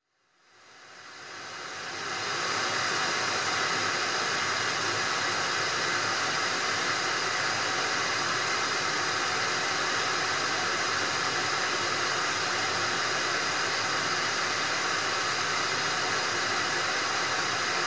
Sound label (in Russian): belij shum shipenie